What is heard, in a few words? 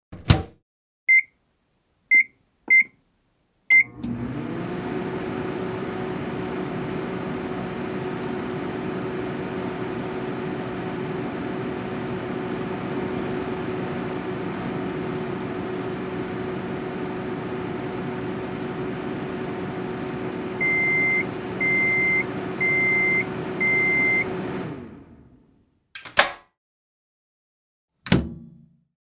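microwave oven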